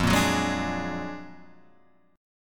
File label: Gb Augmented Major 9th, Guitar